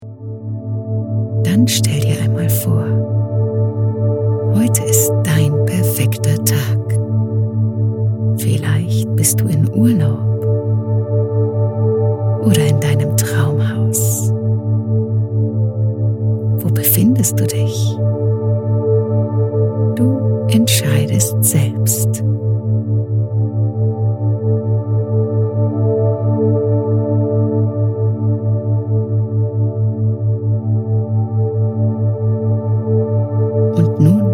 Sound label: binaural; ky-o schlank